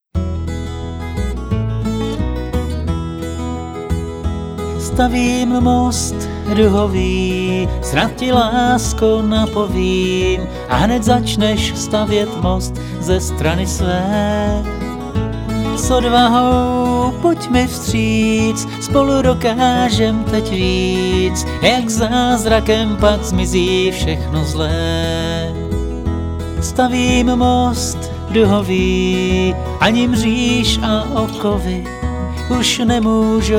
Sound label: guitar